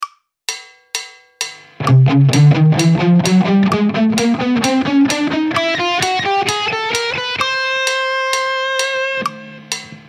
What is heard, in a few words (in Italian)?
Scala cromatica Do ascendente